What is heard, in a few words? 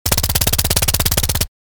Thompson submachine gun full auto fire; war